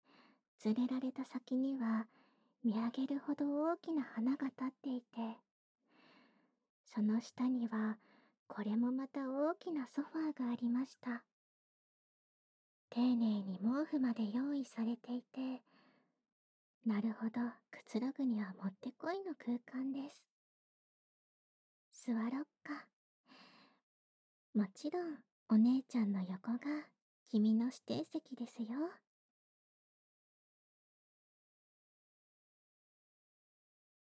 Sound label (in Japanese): 耳舐め、フェラ; いいですか～?